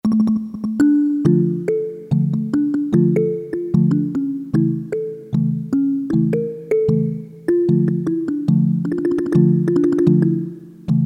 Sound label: Kilimba, Korg DSS-1 DWGS synthesizer; Korg DSS-1 Sound Library